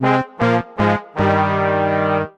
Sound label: Se Coop Failure; Summer Party Time